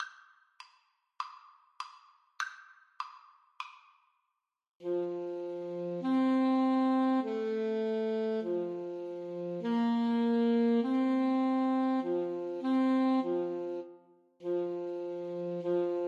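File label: Alto Saxophone Duet, karaoke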